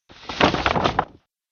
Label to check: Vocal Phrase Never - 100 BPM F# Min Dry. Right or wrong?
Wrong